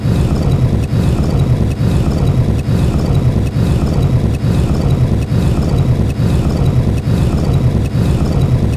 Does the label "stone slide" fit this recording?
Yes